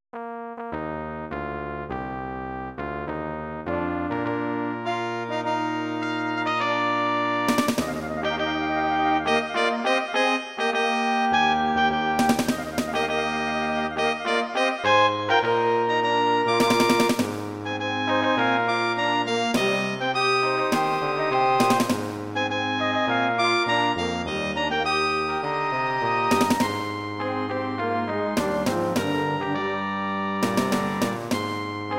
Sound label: fanfare; ada-fanfare改版 PC演奏; ＜ファンファーレ 5/2改版 八尾高校校歌前奏＞; 八尾高校２８期; Mastering fanfare